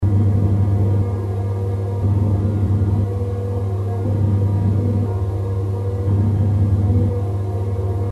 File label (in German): Smoothie Nebenraum, blendtec625; Vergleich